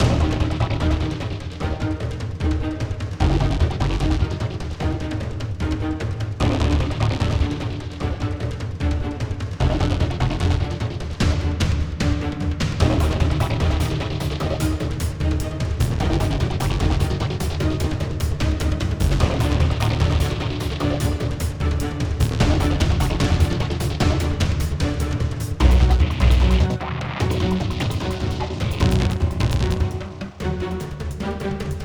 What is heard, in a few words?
Low Loop